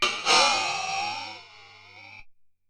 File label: Ring Mod, Cymbal, contact mic; Murf & Ring Mod